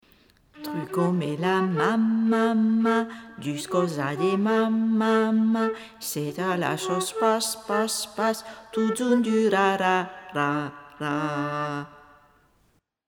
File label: vocal